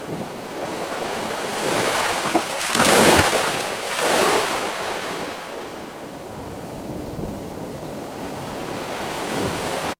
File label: ambience ocean